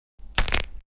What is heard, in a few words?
Breaking Small Bone Finger